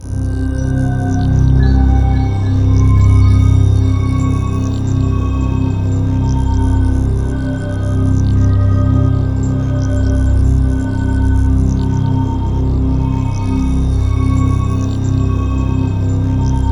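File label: slow motion C2, ambient atmospheres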